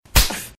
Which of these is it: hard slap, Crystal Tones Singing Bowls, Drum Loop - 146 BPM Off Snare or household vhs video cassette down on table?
hard slap